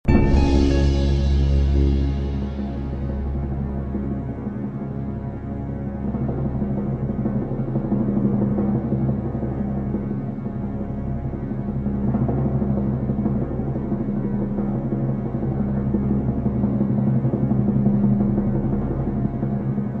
barabannaja drob; iz kto hochet stat millionerom

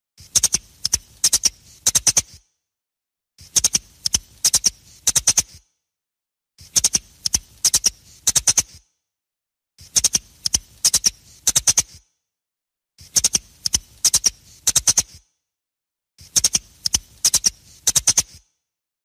laski

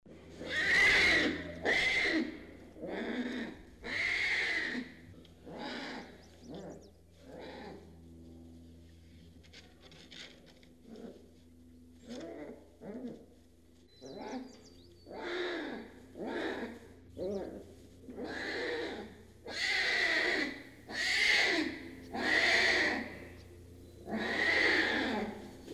malenkij tigrenok

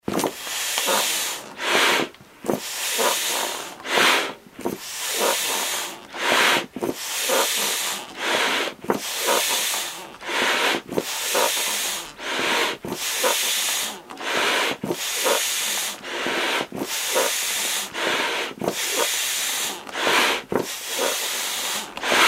nozhnoj nasos dlja matrasa

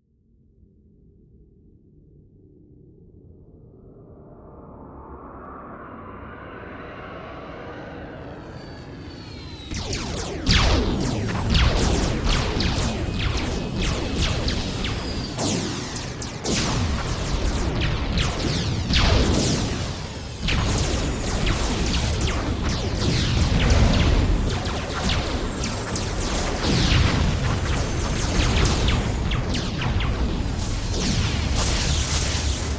zvuki kosmicheskoj bitvy

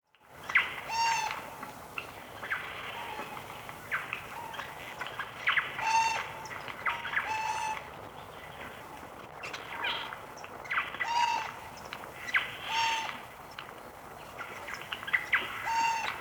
sojka pri tokovanii

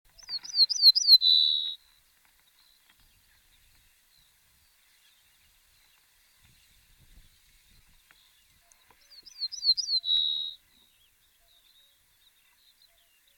zvuki penija sadovoj ovsjanki

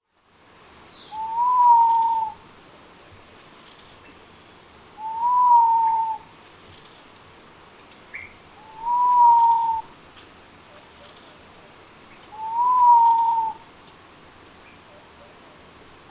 zvuki sapfirovoj gorlicy

